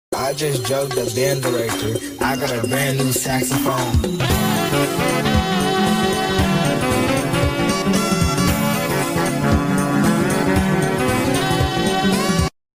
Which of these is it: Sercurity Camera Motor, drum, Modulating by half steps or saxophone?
saxophone